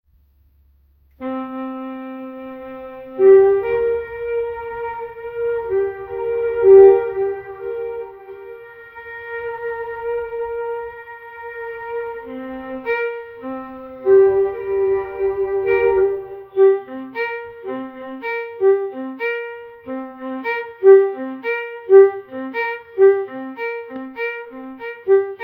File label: sad violin background music